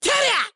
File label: Attack